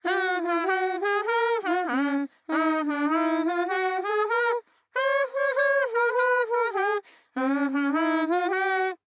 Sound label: Voice song, ja